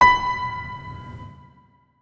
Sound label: notes, keyboard piano; Hideout Furniture